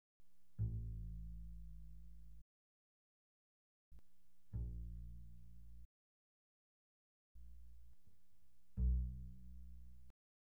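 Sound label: Bass pizzicato pianissimo sul A A1 B1, doublebass; Strings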